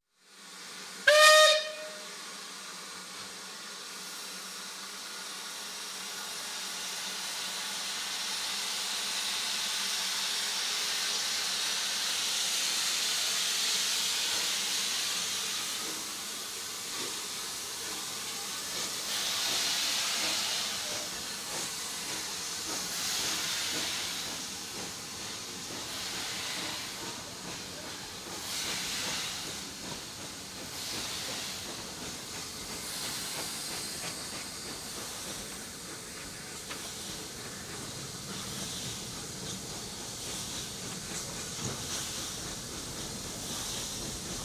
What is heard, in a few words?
Steam train; Travel & Transport